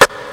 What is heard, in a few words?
rich top end snare single hit b key; snare drum samples